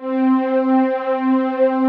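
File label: analog strings; Pro-Rec - Synthsonic; TRINITY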